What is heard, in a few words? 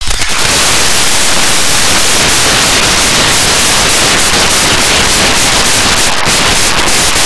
steam